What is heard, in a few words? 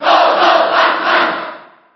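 Cheer Japanese, crowd; Pac-Man; SSB4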